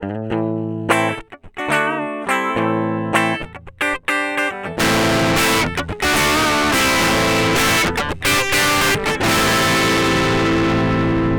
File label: clean vs middle distortion level; BOSS DS-1